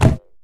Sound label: Impact Rubber